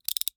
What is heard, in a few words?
Foley Toy Handcuffs Latching